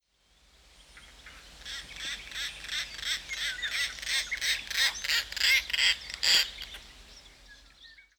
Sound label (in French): rollier d europe